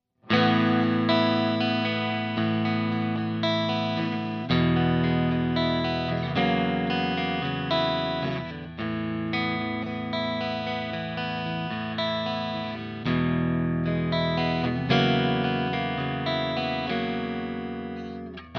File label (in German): Schecter C-1 FR-S Hals Pickup Clean